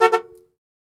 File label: Jeep Chrke Horn Honk; jeep cherokee 84